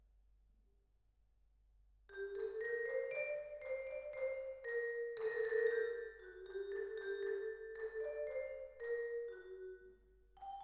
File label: Twin R Vibra